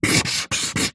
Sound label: office marker pen highlighter luminous draw diagram on paper